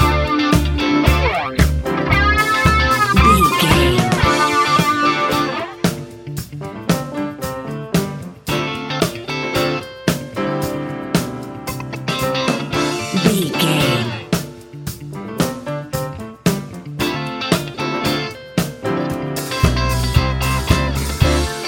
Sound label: disco fever